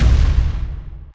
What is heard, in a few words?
brutal murderer die